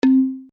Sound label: BALAFON DO; MOBICLIC 77; RATOCLIC